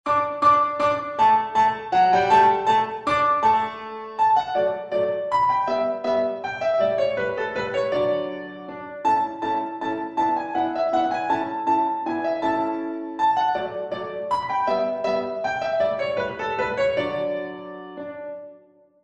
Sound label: German Dance; Haydn